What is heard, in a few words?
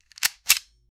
sound effect of an ak 47, magazine